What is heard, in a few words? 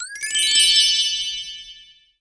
SKILL GET